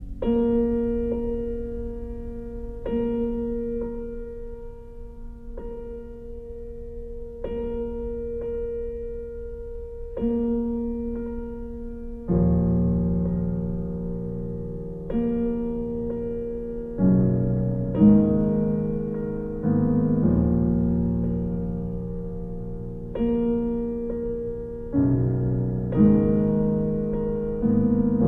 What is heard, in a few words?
piano; Gaspard De La Nuit; Le Gibet